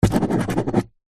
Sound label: office pen ball point write signature paper on plastic table